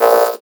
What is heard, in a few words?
Vocoder Oneshots; Vengeance Samples